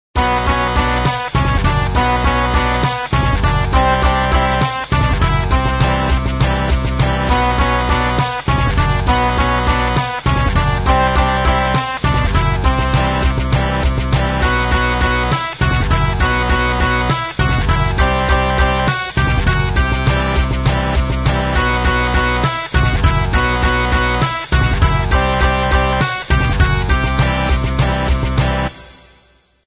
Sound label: midi; antichrist